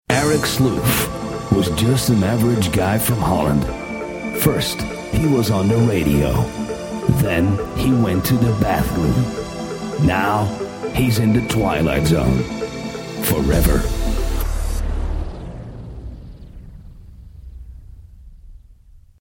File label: Jingle